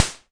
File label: mattel sd, 8 BIT; LO FI; Mattel Synso